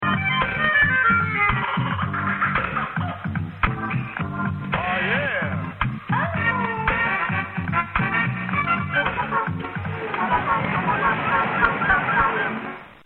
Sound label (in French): jingle ete